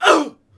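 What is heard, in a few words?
Swordsman Hit Vox; Civ4 warlords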